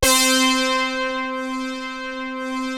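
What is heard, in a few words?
MOOG BASS C4; USB Soundscan vol.49; Analog Fantasies; AKAI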